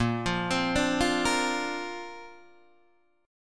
Bb Major Add 9th, Bb, down, Guitar, Open D

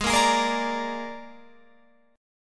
Ab Suspended 2nd Flat 5th down Guitar Open F; strummed; JGuitar

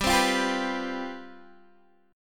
C Major 7th Suspended 4th, G, guitar chord

